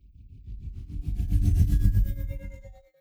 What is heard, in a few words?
SCI FI Scan